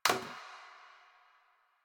Janitor Plastic Spray Bottle

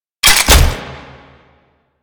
Shotgun Mech; Warframe; Veilbreaker; Kahl

